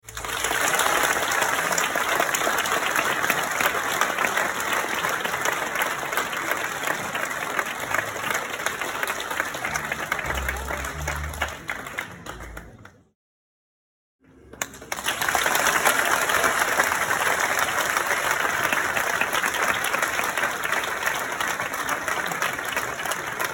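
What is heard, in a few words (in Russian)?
aplodismenty v teatre, аплодисменты в театре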